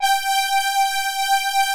PADS; Pro-Rec - Synthsonic; Z1 PADS

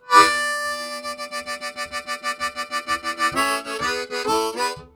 Long Vamp, Harmonica; Zero G

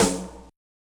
Yamaha DJX 2B, SD Hip Hop

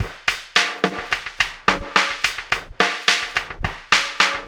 Ripple Perc

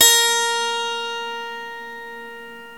SWEDISH; World Combo

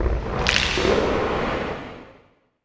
SPACE-FX

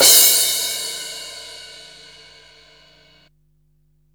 CYMBALS, Disco House

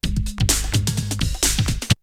Drumloop; ZERO G - Datafile DISC 3

